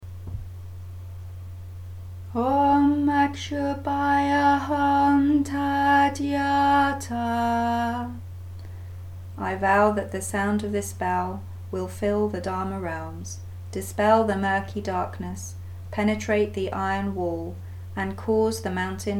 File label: Waking Bell